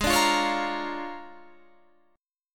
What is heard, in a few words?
A sharp 7th Sharp 9th